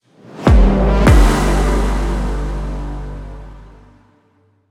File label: Mission Failed; Gangstar New York; Android; Windows